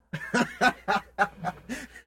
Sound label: laugh, Voice Human Male